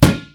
rubber tire impact bullet; physics

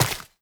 step, footsteps, player, mud

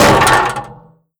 vent step, headcrab reviver, creatures

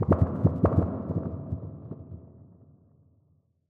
amb abstract bubble impact, ambient

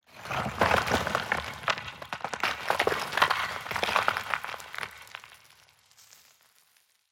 tenement rockfall; ambient, rocks

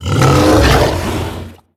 idle in, barnacle; Half Life Alyx

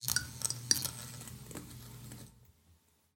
light flicker, ambient lights

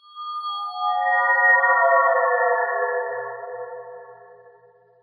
ambient abstract metal evo; Half Life Model Pack

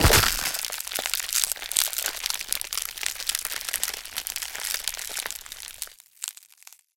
stretch, glue; infestation

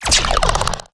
bolt huge zap

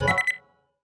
lobby got invited; ui

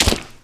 fs fm paint, footsteps; Portal 2

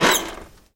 fridge creak; scripted sequences

